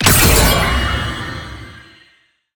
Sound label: minigame SFX assist; Irelia; bloom minigame